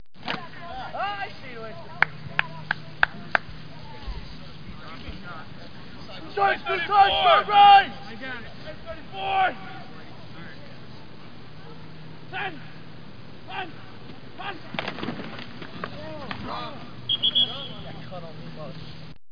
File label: foot bl; Sound Effects Library 1.5